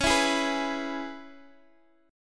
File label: C sharp Minor 7th, Guitar, DADGAD; x,x,11,9,11,9